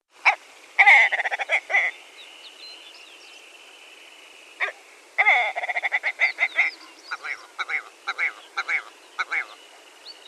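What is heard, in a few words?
willow ptarmigan voice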